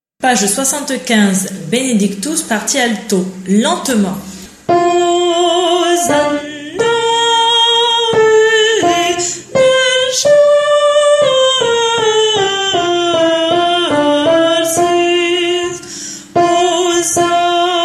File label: Alto; Requiem; Benedictus; Mozart